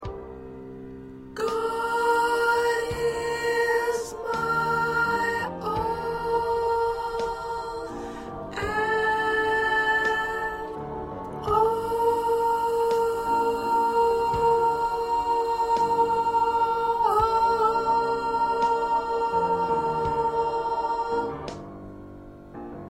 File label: 2nd Soprano